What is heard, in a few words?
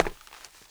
hardwood, footsteps; 1000fx